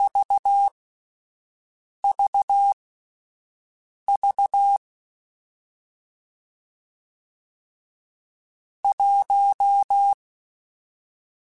Morse Code, CW Code Groups; Mixed

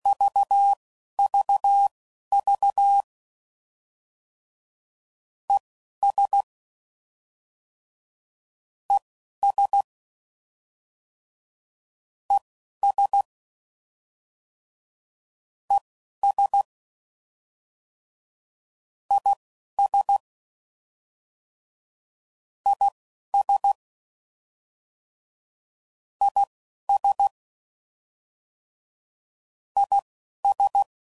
CW Code Groups 10WPM, Contrasts; Morse Code

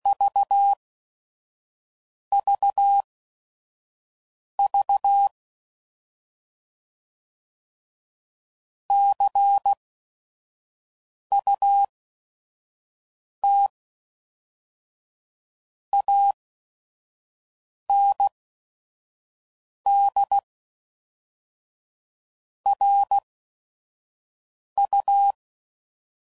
Word Pairs, CW Code Groups 5WPM; Morse Code; HamRadio; Mixed

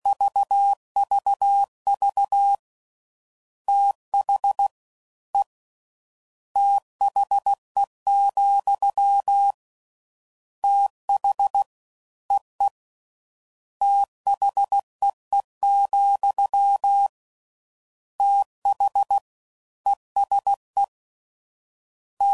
Fast TH, CW Code Groups 15WPM; Morse Code; FLs & THs

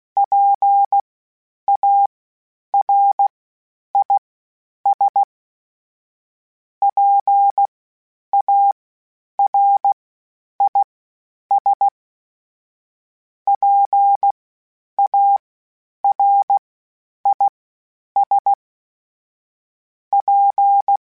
PARIS, Morse Code, Calibration; HamRadio

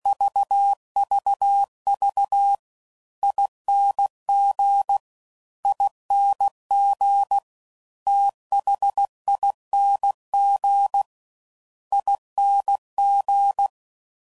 Fast Ings, CW Code Groups 15WPM; Morse Code; Special INGs

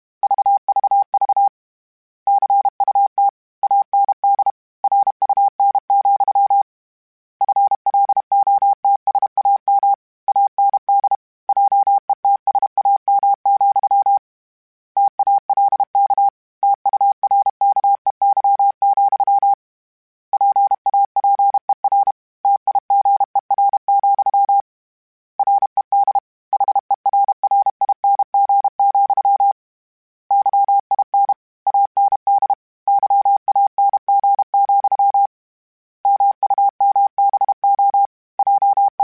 Word Pairs, Morse Code; Mixed; HamRadio

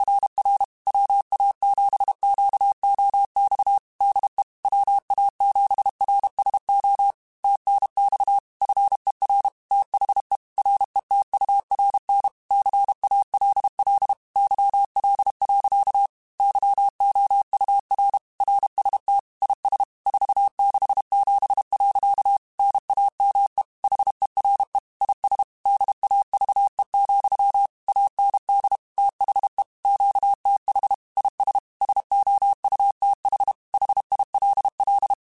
Morse Code, QSOs; HamRadio; CW Code Groups; WA7QOX

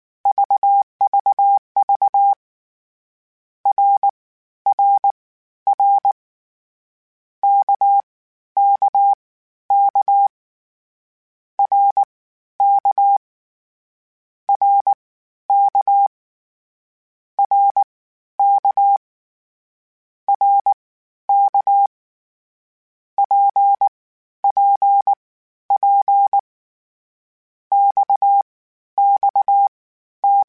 CW Code Groups Mixed Short Runs Balanced; Morse Code; HamRadio

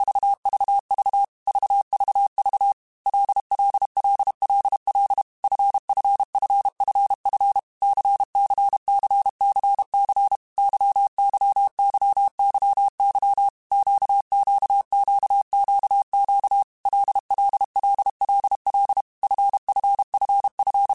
LFCYQ, Morse Code; Basic Sets; Basic Letter Groups By WPM's

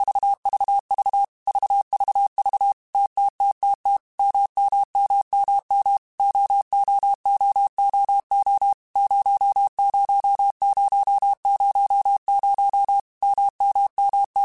TMO0, Morse Code; HamRadio; Basic Sets

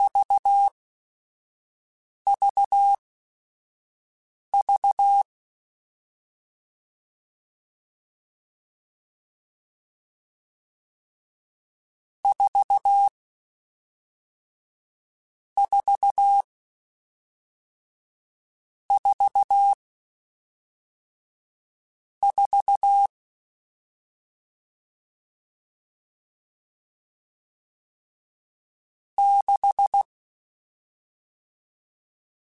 CW Code Groups Mixed Short Runs Staccato; HamRadio

